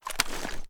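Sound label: submachine gun drop; gmod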